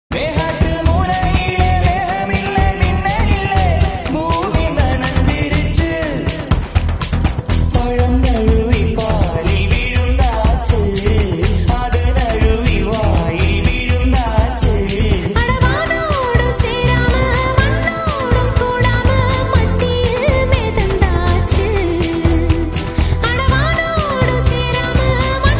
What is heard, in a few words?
flute bgm ringtones